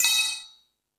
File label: Hip Hop, Drum Machines